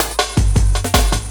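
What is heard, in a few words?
LOOP snare drum, Drum & Bass, 160 BPM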